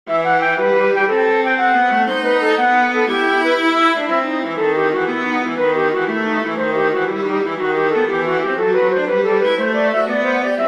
gavotte for flute viola; bach johann sebastian; from the partita minor

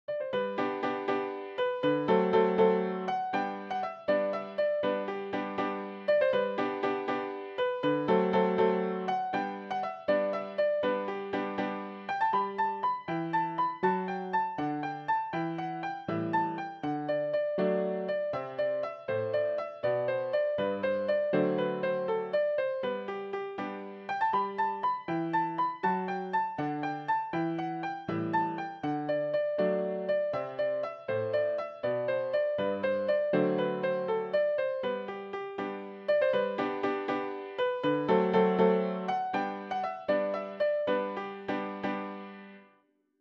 irish lilt

